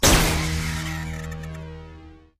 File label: balloon death; Teen Titans Go! - Raven's Rainbow Dreams